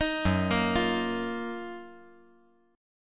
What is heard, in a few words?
F sharp Major 13th; arpeggiated; JGuitar